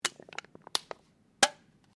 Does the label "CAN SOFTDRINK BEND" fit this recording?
Yes